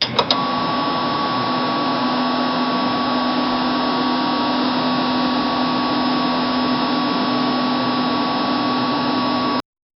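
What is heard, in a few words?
new windows system startup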